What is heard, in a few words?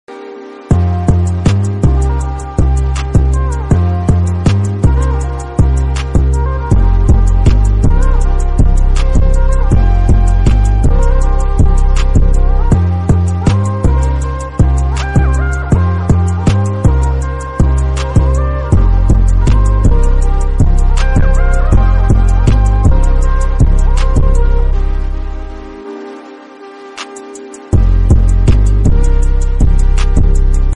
instrumental instrumental bgm